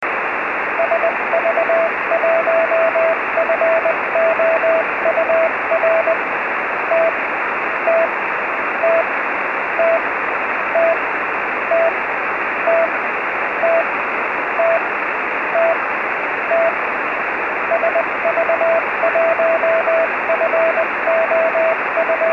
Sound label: 70040khz; 70mhz